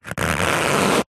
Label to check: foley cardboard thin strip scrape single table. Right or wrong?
Right